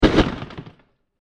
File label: Smashed Wood Impact Blast; Impacts; Blasts; Crafted Signals